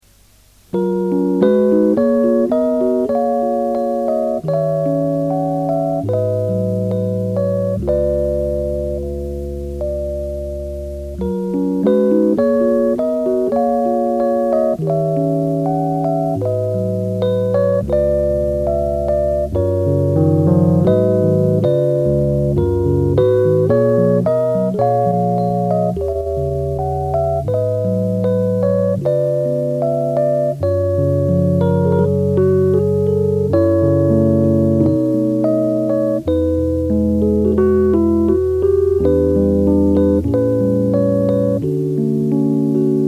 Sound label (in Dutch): karaoke versie